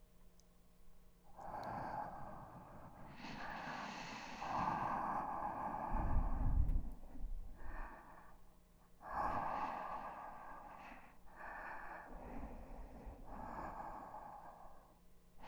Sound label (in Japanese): 寝息; 深雪